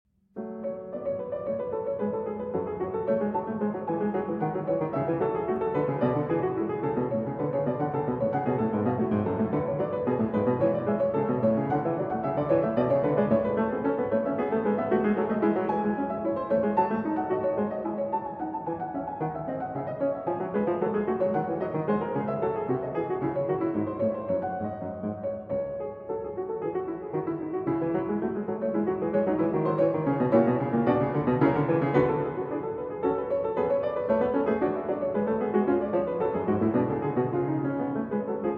Prelude & Fugue in F-Sharp Minor, BWV 859 I. Prelude